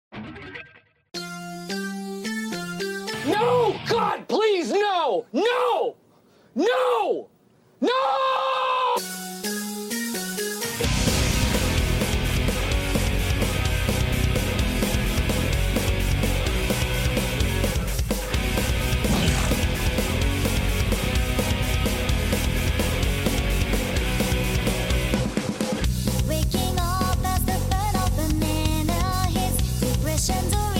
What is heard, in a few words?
Pop Punk ver.; Clone Hero Chart